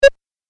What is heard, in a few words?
Retro Pong Tennis Hit; Video Game Sound Effects